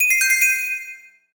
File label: Pickup Gem Bells; SFX Kit; Pickups